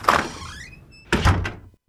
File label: Door